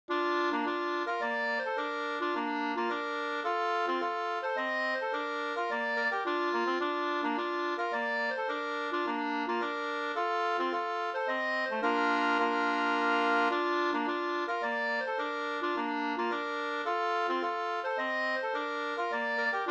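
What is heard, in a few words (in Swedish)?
polska, tvåstämmig; Jader; Kare o Birgitta Widen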